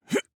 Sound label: Grunt Jump; Knight; Paladins